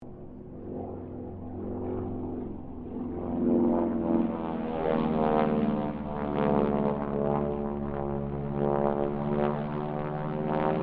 Harvard squadron 4 pass, stereo

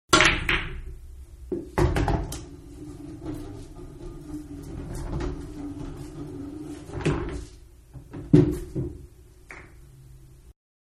Billiards Break one ball in pocket; Pool; Sports; Manhattan Production Music

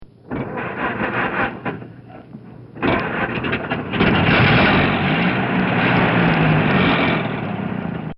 Model A Start, car; Car Sound Effects; Vehicles; recorded in a custom built sound booth

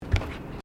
Footsteps Bare feet Wooden Deck Step; Footstep Foley; Human

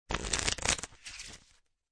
Cards Playing Cards Shuffling; Playing cards, poker, shuffle. Shuffling cards. Version 1.

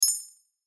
Chime Twinkle; Chimes; Blastwave

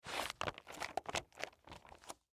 Magazine Stack Pick Up One At Time; Paper Sound Effects; Materials; Foley

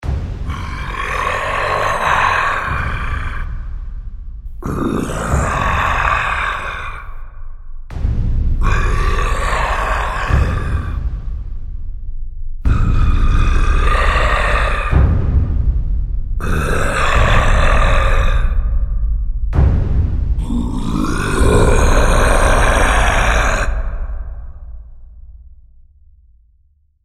Giant monster walking with a thud; Monsters; Horror

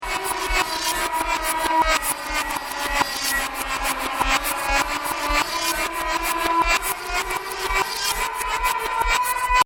Weird Drifting Loop, sci fi; Looping Sound Effect; Andrew Fleming

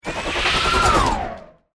Chopper Whoosh; Sound Design

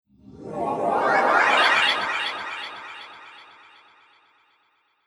Magical Shimmer, magic sound effect

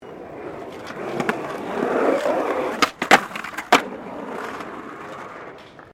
SKATEBOARD FLAT JUMP GRIND; Skateboard sounds; Skateboarding; Sports; Foundation4

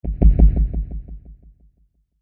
Hit Thump Delay Heavy; Blastwave

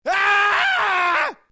MALE SCREAM; Male Vocal sounds; Screaming

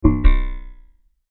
Mouseover Dark Low Bass Click Descend; Multimedia; Blastwave

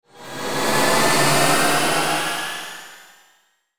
Ascend Bright Airy Whoosh; Airy Sound Effects

